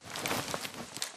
spectre blind fire grunt; Titanfall 2

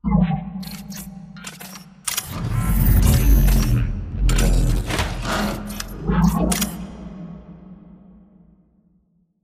pipe idle reverb; bt; beacon; control room; Titanfall 2

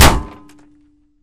Imp Bullet Light Ballistic Solid Metal

